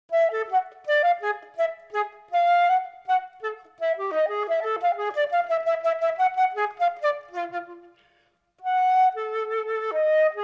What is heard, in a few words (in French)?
pour flute solo